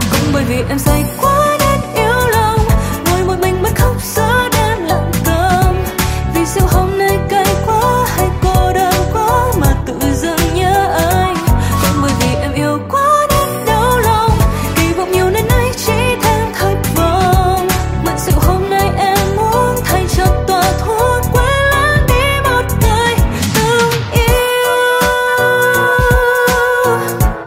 Nhac Chuong

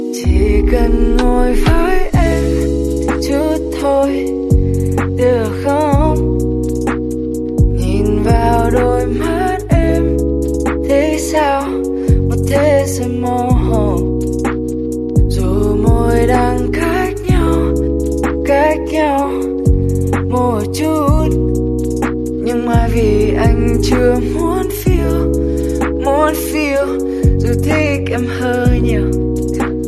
Nhac Chuong Lofi

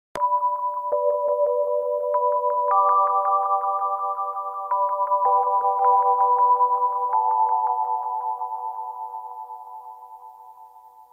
nhac bao gio; cua dai tieng noi Viet Nam

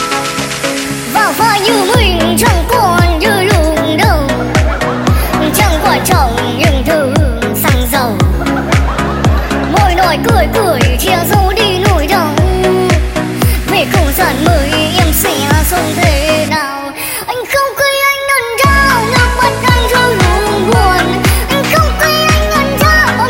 Nhac Chuong Baby Version Remix

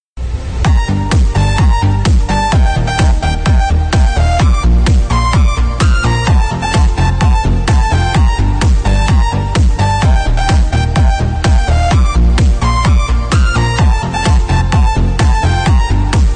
Nhac Chuong DJ Nonstop Bass Manh; nhac khong loi

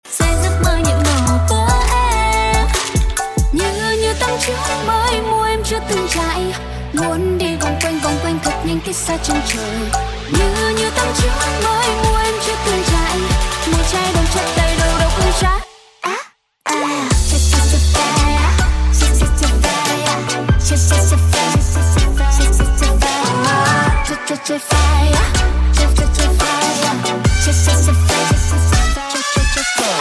Nhac Chuong Trai Trai Trai Phai Tiktok

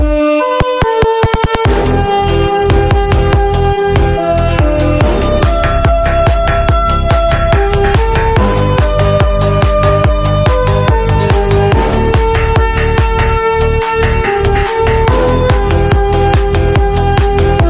Remix Tiktok Khong Loi

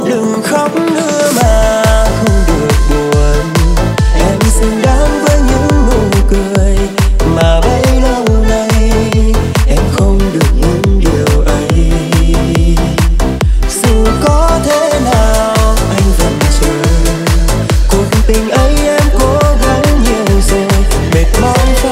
Nhac Chuong Remix

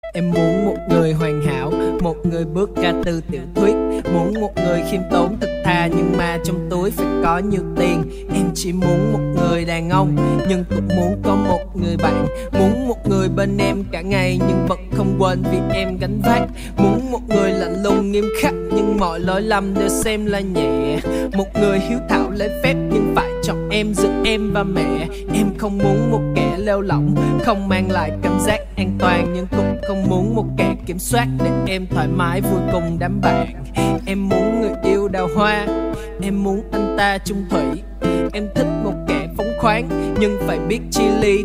Nhac Chuong Rap; nhac tre

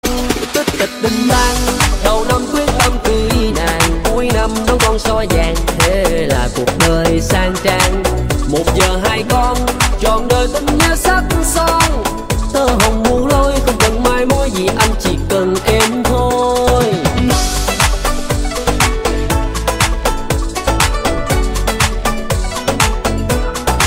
nhac chuong remix

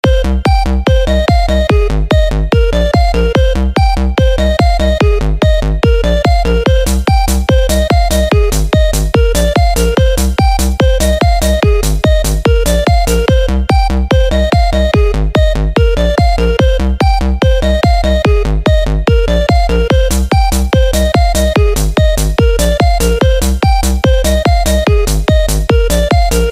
Nhạc Chuông Galaxy s8 Plus Remix

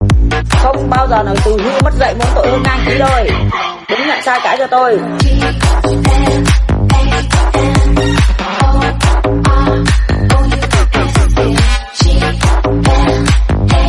nhac chuong remix

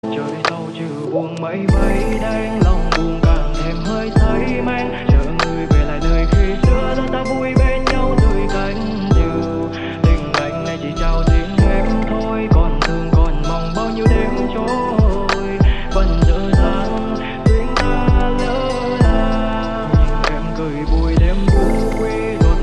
nhac chuong lofi

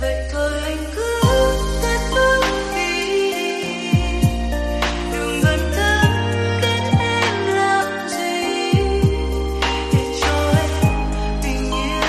Nhac Chuong Lofi

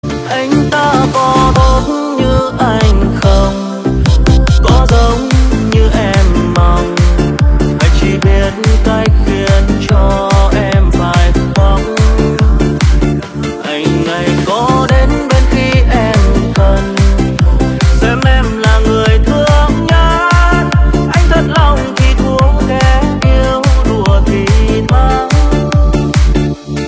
nhac chuong remix

